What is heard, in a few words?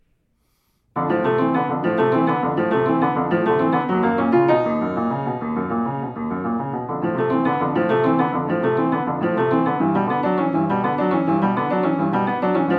Dorian Rings; Albumleaf 142: September 5, 2018 (Bologna); process music